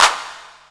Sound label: CP, CLAPS, House Side Of 2 Step; USB Soundscan vol.51; AKAI